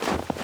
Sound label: snow step; Golden Crayon Sounds